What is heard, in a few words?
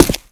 foot stone; Call of Duty Modern Warfare